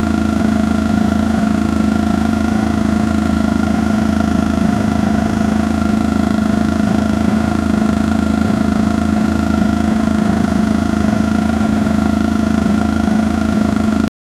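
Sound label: Honda Civic engine high rpm; automobile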